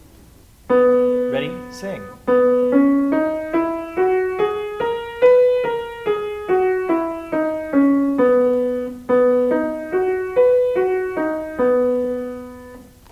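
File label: B Major, scales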